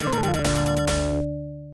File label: Miss